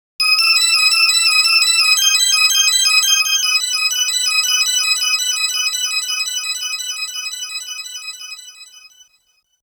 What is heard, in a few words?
QSound Logo; SSF2; Super Street Fighter II